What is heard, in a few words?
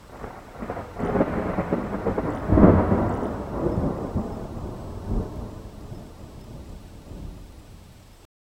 sharp crack of thunder followed by distant rumbles